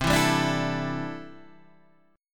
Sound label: C 6th Add 9th, C, down, Guitar, Half Step Down; chord